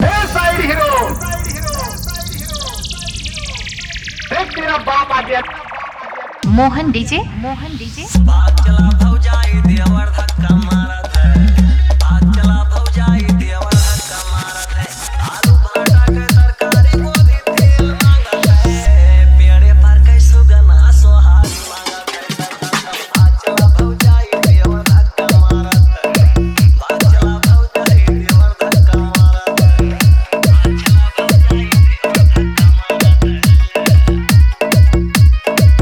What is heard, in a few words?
Bhojpuri Remix Song